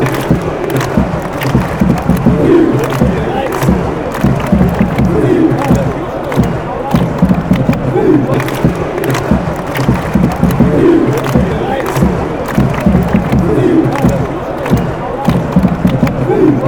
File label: chant, moenchengladbach